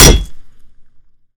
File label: crowbar hit; melee